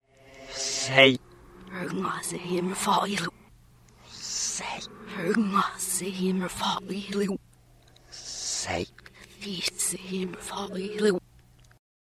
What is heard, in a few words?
reverse; backmasking contrario